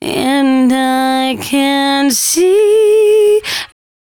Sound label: 113bpm C#, Velvet Vocal; Zero-G